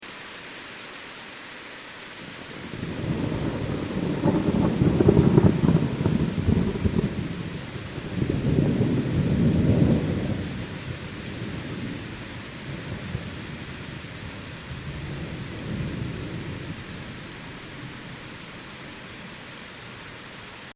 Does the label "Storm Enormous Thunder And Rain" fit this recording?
Yes